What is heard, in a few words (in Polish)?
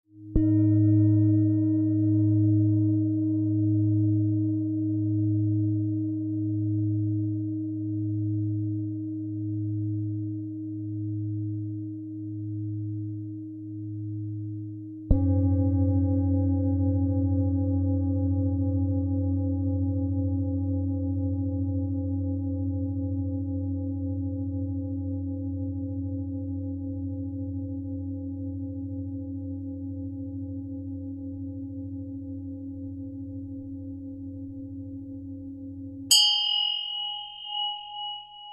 dzwiek mis tybetanskich dzwonkow i gongu; Anna Szteliga